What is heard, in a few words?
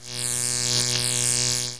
electric